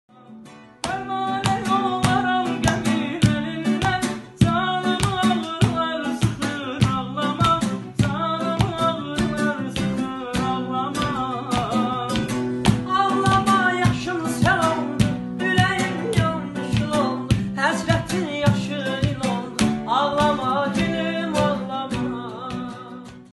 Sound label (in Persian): torki ghamgin ba gitar